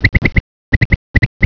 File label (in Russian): perestrelka svist